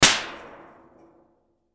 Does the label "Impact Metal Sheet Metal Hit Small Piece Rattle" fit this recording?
Yes